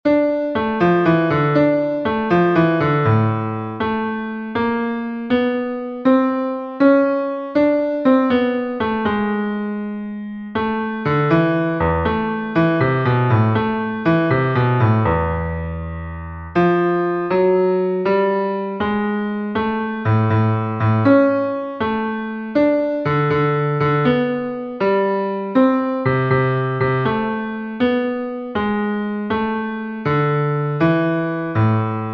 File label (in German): Partimento in d-Moll; F. Durante